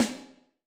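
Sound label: Bright Piccolo Room; Alesis SR16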